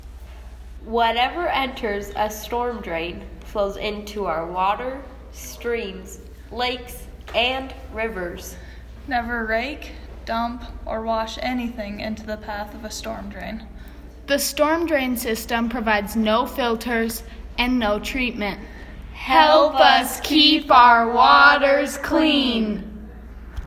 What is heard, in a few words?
water